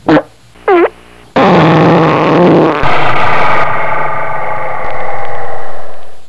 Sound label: fart ringer